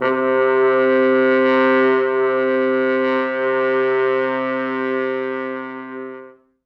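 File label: French horns soft mutes, four, C2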